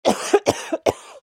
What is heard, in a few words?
female muffled cough; sound-ideas